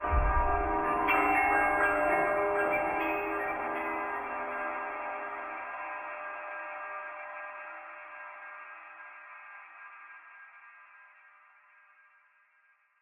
Sting Bells; Dead Rising 2 Case West; Xbox 360

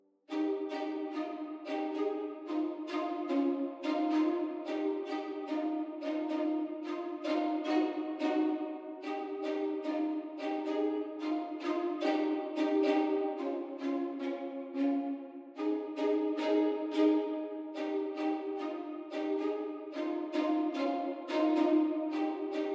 Stac strings2; toki tori 2

